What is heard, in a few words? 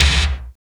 base drum; DRUMS